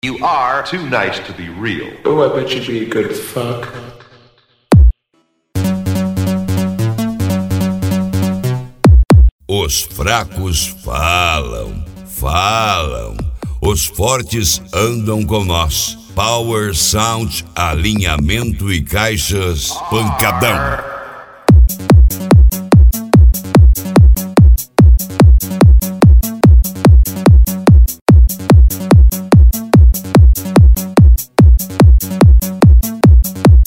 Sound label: pancadao; Power Sound Alinhamentos e Caixas